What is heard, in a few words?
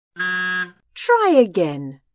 wrong